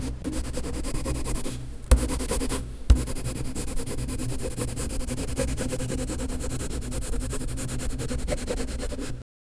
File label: crisp energetic fountain; acoustic